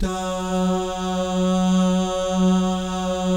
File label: DAH, Vocal Jazz; ILIO